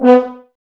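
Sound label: brass horns B; Sample CDs; Miroslav Vitous; Woodwind Brass Ensemble; F.Hrns Links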